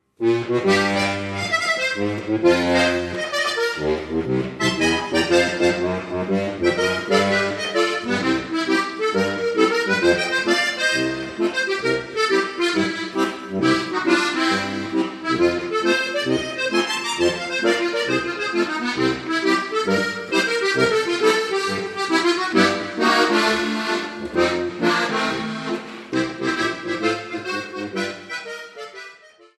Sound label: blues